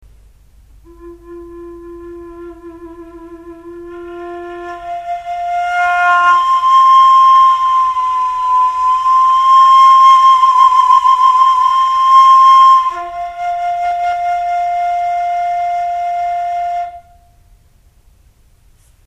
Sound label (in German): Obertonfloete Bambus